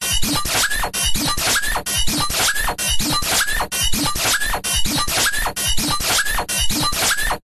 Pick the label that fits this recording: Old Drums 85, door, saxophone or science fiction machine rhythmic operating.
science fiction machine rhythmic operating